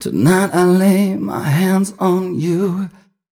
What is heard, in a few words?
m 128 mel b-flat, Vocals